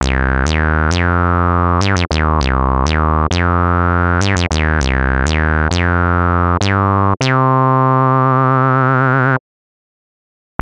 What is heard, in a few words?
Oberheim OB-X Reso Bass; GForce